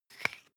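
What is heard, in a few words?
toothpaste open twist hard, Bathroom; haus-garten; badezimmer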